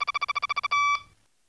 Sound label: eng beep; trek